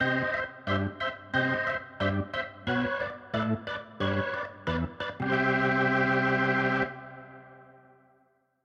organ

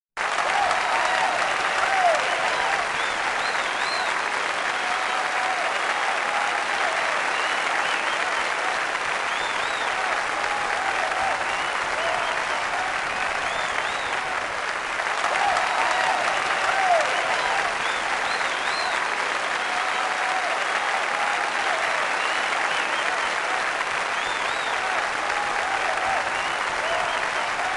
Crowd; Kid Pix 3 Deluxe; Windows

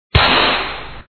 gunshot; Bin Laden Liquors

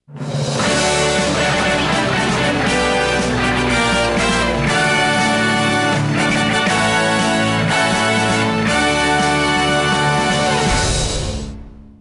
Victory

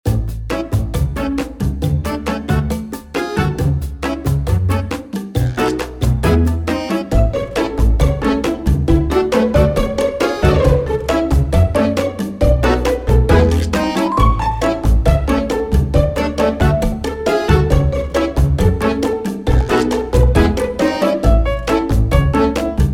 Band Practice Tune; Wowl; A Hat in Time

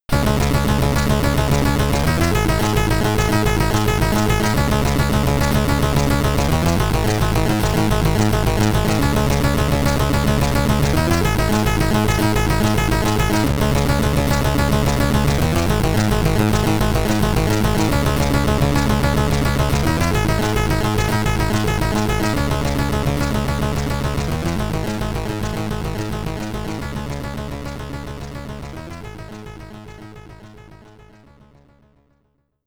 Boss SID Stereo; Gemini Wing; Commodore 64

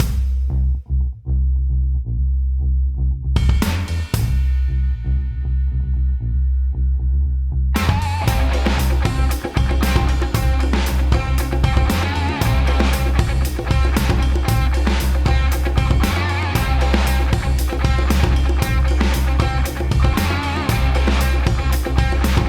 music level

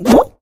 Pigs Potion Bubbles; Angry Birds

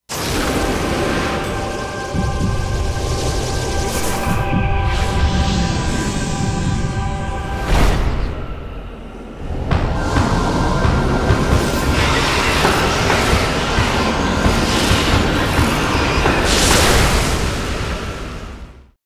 Ending (With SFX)

